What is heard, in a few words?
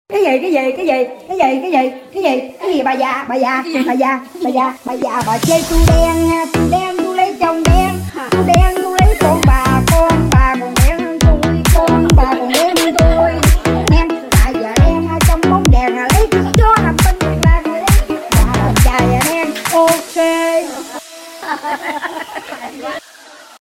remix tiktok